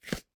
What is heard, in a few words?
handle Small Leather; kenney rpgaudio